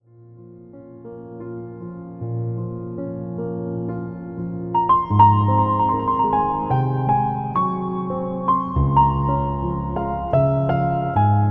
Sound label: Instrumental Piano